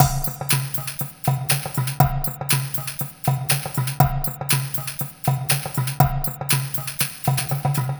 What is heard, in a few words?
Groove; Sound Effect Library; Electronica; Composite Beats; Empirical